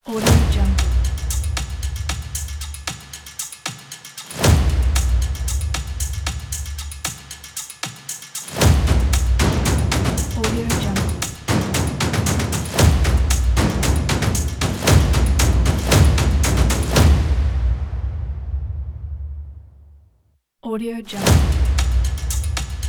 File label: epic trailer percussion logo; msvsound